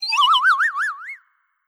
Stun; Legend of Keepers (gamerip) (2019)

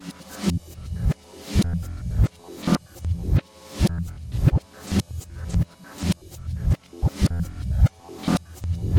Speaker-Mic Glitch-Jazz Loop Rev

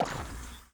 Plasma Hit; Ion Shift

